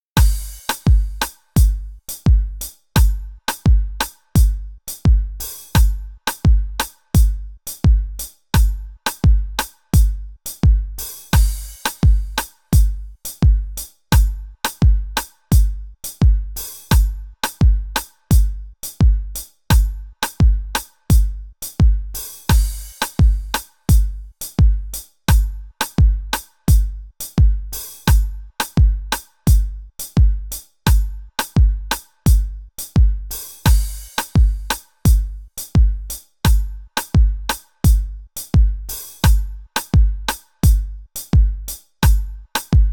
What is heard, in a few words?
pattern 86bpm, kizomba dance